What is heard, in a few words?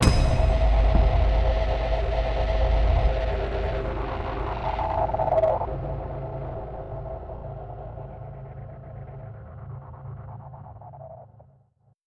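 Fluctuation Metal Swirl; Atmospheres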